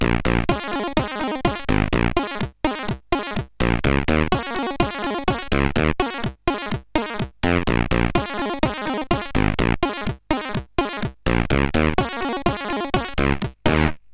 CSG8580; Uppercut

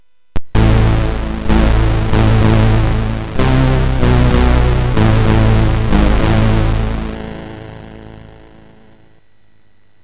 Zap, MOS6581R3; hvsc

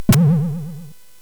SFX; Narco Police; hvsc

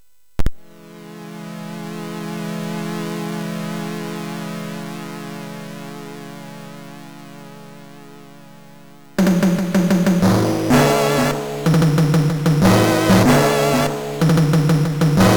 Orchestra; MOS6581R3

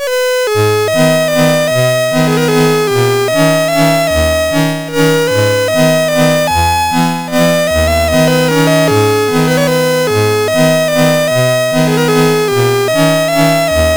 Shubert Waltz, MOS6581R4

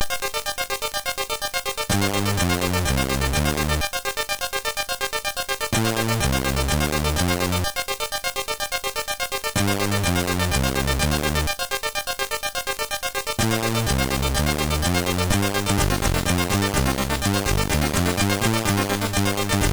Remix, MOS6581; MOS6581R3

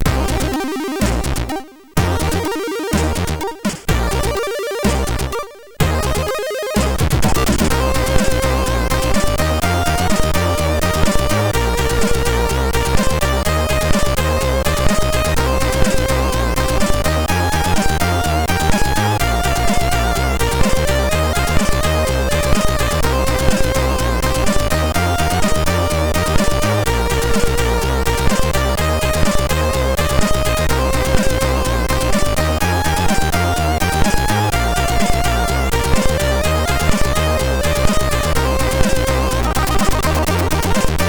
remix, MOS6581R3; sid; Vagabond; hvsc

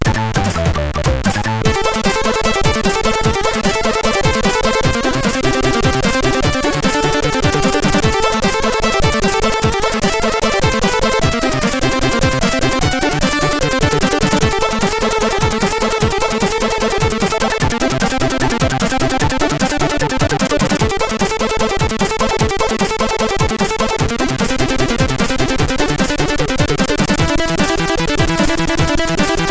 cover, MOS6581R3; sid; Lotus; hvsc

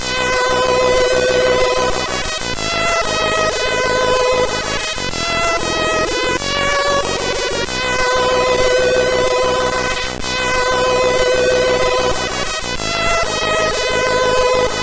Jingles; hvsc

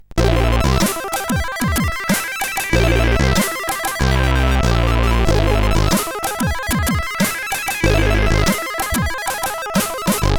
Doom Jazz, CSG8580R5

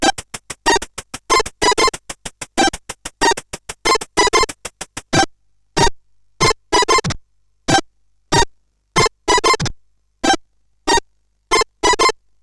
Another Sound, CSG8580R5

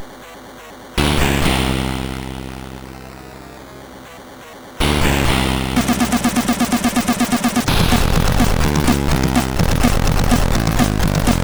Metallic, sid CSG8580R5

